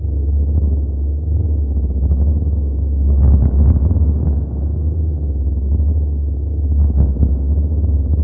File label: very low, very low drones; Zero-G Ambient 1